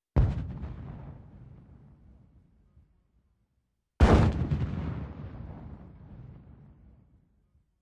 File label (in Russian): Tank vystrely; Танк М1 выстрелы; Звук танка в движении, заводка, старт, остановка внутри и снаружи. Выстрелы танка